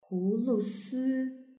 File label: hulusi; yueqi